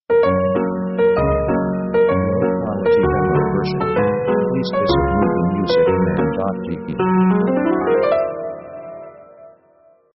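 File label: orchestra fast waltz sketch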